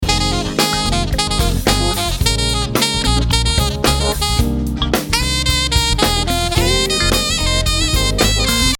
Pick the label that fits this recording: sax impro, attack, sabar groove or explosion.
sax impro